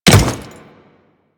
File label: Default Step, Footsteps